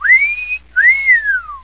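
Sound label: wolf whistle; Flirty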